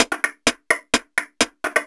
VFX2 Loop 128 BPM

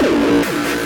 Fill 140 BPM

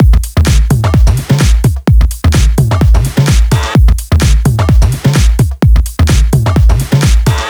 128BPM Tribal Kiss Kit

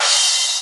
Crash, Cymbals; Vengeance Samples

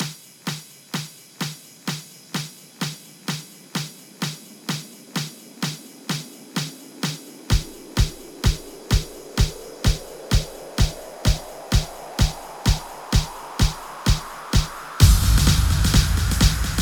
128BPM, House Electro Fills; 24Bars; Sickbay; Vengeance Samples

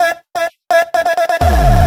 128BPM Heya Filler 1Bars; FX Fills; Vengeance Samples

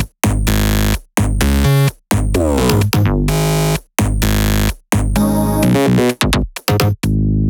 Freak Melody 2 Root G#, 128BPM; Vengeance Samples; Freak Kit

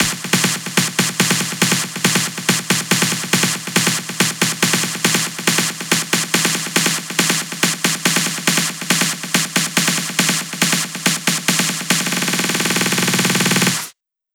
140BPM SNARE 8 Bars; SpeedYo; Vengeance Samples; VUF1 Snares&FX Pairs 140BPM

